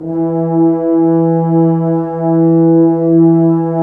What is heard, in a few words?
BRS, FHns Dry; Roland L-CD702; F.Horns 2